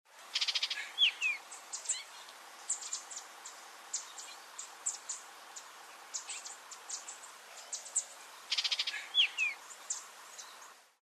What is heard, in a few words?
baltimore oriole, birdsongs, belize